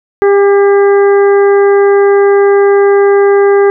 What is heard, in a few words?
composite 400Hz dynamic phase shift mixed; KSP-440; KSP-400 次期モデル・オープン開発; ④と⑤を比較しやすく繋げたWavファイル